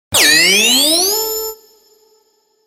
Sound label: Buzzer Tone